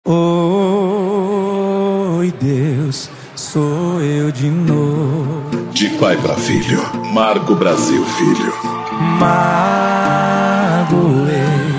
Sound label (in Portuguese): sertanejo com locutores de rodeio